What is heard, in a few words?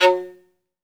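SOLO VIOLIN, Synclavier Strings; ILIO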